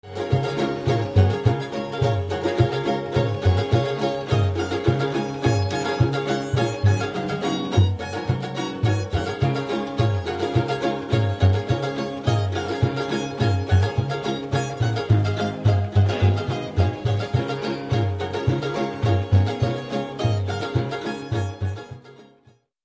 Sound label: string